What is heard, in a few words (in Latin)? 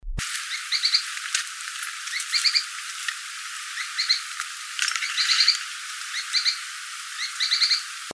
Synalaxis spixi